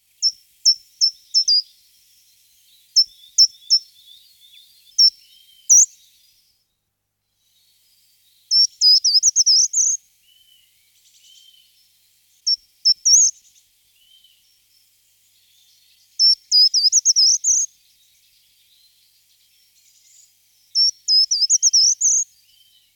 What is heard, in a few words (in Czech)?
Soupalek kratkoprsty Certhia brachydactyla